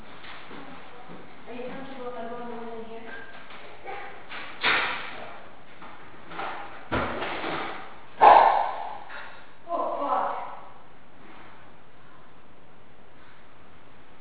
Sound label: loud pop when light was turned on